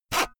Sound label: saw use short fast